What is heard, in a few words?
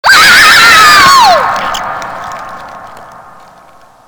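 zombie female scream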